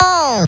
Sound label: Misc Short FX; Vengeance - Essential Clubsounds Vol.4; Effects